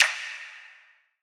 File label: Perc; Bitcoin; Cymatics; Trap Starter Pack; Percussion